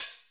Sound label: hat; osu